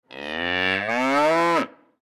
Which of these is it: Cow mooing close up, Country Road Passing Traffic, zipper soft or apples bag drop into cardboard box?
Cow mooing close up